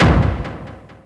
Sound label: FX HIT, Techno Trance; Ueberschall